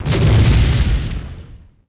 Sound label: bodyfall, creatures; bantha; Star Wars Obi-Wan; XBOX